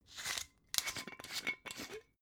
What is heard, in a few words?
glass bottle massive open scratch